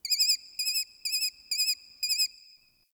Tree Sparrow Hawk; Warframe; Ambience; Nineteen Ninety Nine

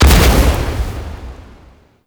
Spin Beam Explosion; Enemies; Weapons; Warframe; Tau; Sentient Dactolyst

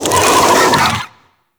Infested Big Ashen Vocal Attack; Warframe

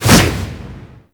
Whoosh; Warframe; Kuva Lich

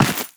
Run Dirt, Footsteps; Warframe; Teshin

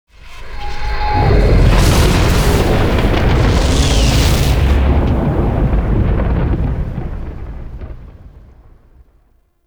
solar flare; Warframe; The New War

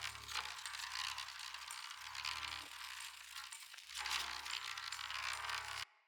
bird repulsor destroy chunk energy fizzle loop; Objects; Thawtide

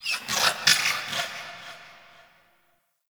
Exterior Creature; Ambience; Misc Creatures; Sentient Lair; Warframe

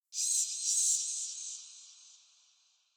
Under City Bat Chatter Distant; Ambience; Dungeons

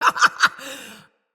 Laugh; Fable Of Garren Rood; Deora

